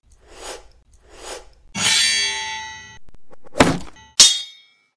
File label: sword sounds